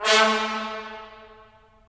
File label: BRS, Tps Stacc; Roland - LA Composer vol. 4